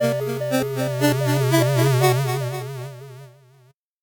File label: 8bit jingle fast